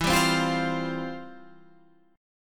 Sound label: C Suspended 4th E; Csus4/E strummed; JGuitar